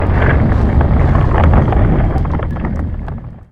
earthquake; Boost 2

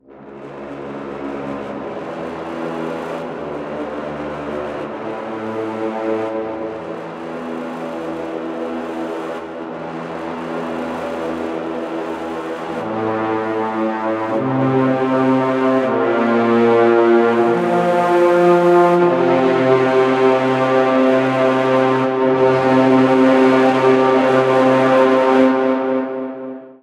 Cave Mid Hi Cor

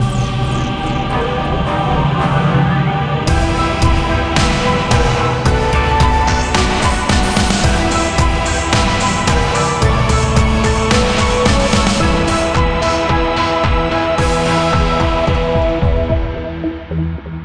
Player Victory; Aces of the Galaxy; XBLA Windows Xbox 360; Officer Battle

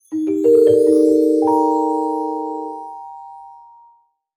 Mystery Prize; Beautiful Girl Fight School

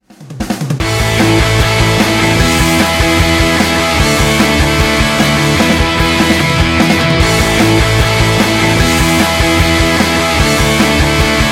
ui music; Snowboard Party Pro

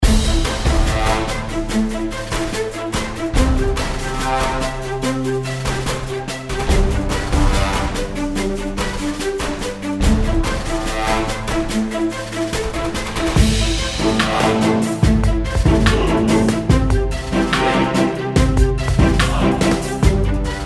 Pvp; Dawn Rising

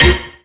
hit monster; birthday party bash; wii; Spanish; laser gremlin

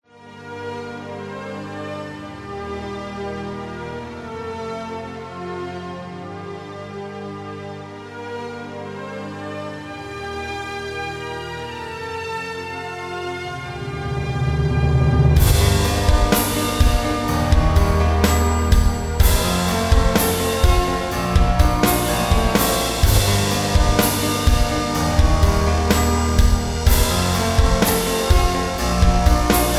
Dramatic Track; Dual Blade: Battle of the Female Ninja

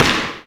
ball bounce; sports room sfx

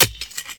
Dig Successful; Prison Architect

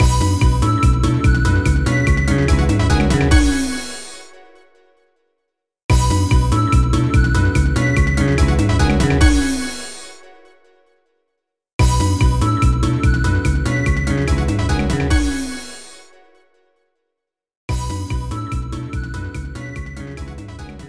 Minigame Success; Gremlins Gizmo; wii

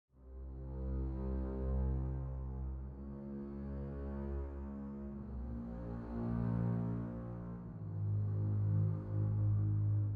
Wind blowing through rocks; Legendary Tales

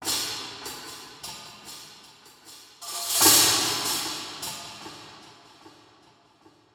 Forest Perc

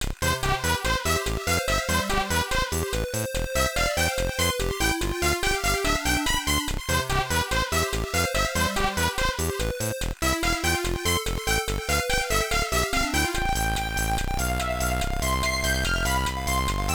Conversation Screen; Densetsu no Starfy 3; GBA

